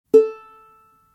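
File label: ukulele a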